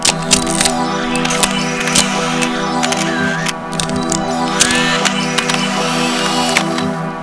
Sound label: Sound open; ERiC KRoLL - Fetish